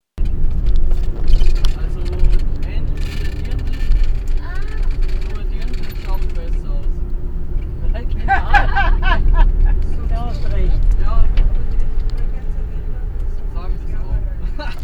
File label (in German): Juppenwerkstatt lachen; Riefensberg im Bregenzer Wald; Lachen im Büssli; Mit dem Büssli auf dem Weg in die Juppenwerkstatt